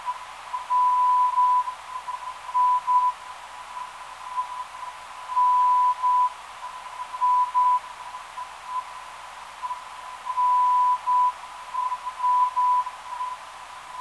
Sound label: NI 1030kHz